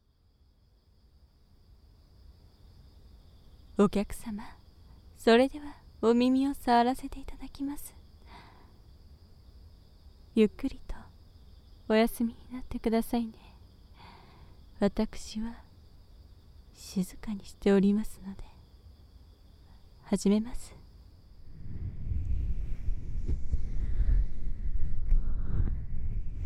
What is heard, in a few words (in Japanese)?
素手耳マッサージ, ASMR